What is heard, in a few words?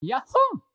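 Yahoo, battlecries; やっほー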